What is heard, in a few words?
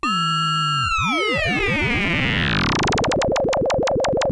Moog F#2; Hollywood Edge; Giorgio Moroder Rare Synthesizer Collection; MLTI.MOOG